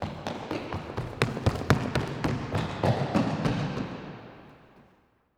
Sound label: Footsteps Wood; MinorHallutinationsSounds1